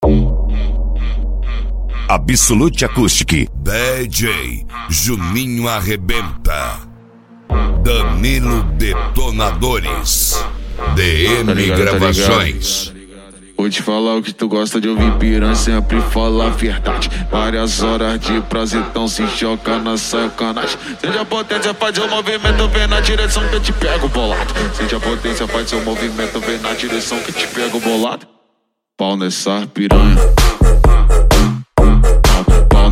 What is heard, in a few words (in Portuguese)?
deep house eletro funk